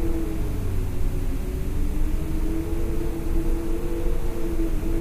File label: wind